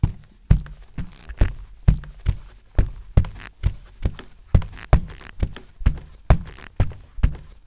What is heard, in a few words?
Burglary Walk; American Fugitive; SFX Loop